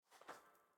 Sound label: Footsteps Constellation Jump; Main Character